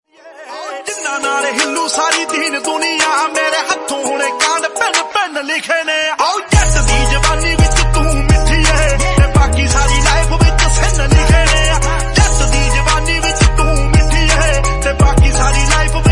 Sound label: Punjabi Ringtone